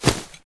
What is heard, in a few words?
Grunt Mvmt FOL Loud Short; Titanfall 2